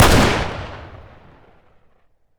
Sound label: m3s90 fire, shotgun; weapons; garrysmod; m3s90p